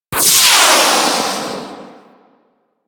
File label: RPG Rocket; Hellion Elite